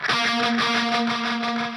riff

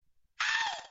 aaow

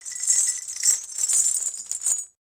chain

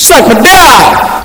rooster

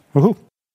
wohoo

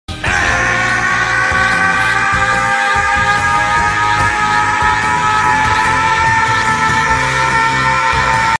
screaming; goku

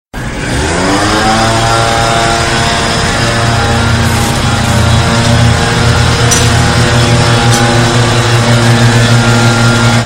leaf blower

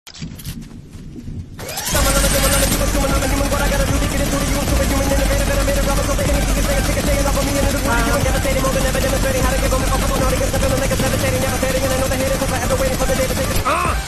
minigun sound

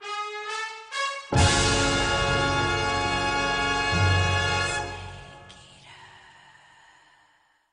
ending ringtone; mgs snake eater

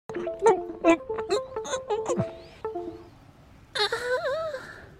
gulp gulp; sussy

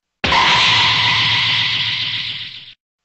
surprised; dbz

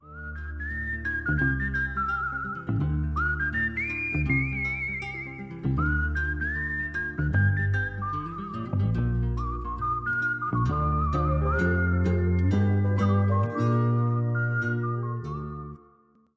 whisle; they call me trinity

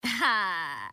rhaa

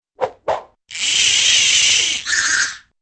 fishing reel cast and quick unwind

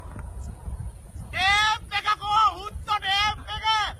tape

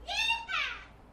yeehaw

